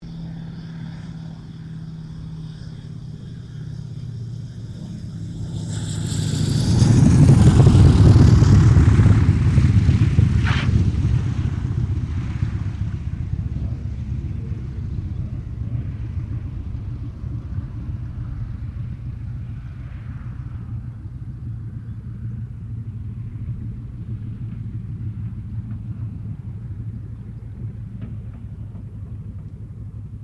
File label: AIRCRAFT CAC WIRRAWAY LAND; CA-16 Wirraway; Airplanes; Vehicles